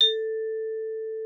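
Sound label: xylophone A4; Xsample VOL-4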